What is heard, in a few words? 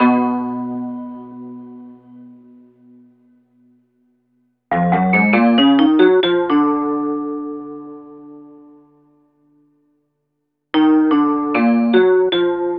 Vibes Guitar Combo, Cinematic Funk; Sonic Foundry (Sony Creative Software); Cinematic Funk Urban Tone Poems